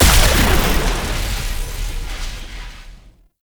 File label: Strike Explosion; Warframe; Trapper